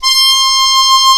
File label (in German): MUNDHARMO VIB; Alpine Volksmusik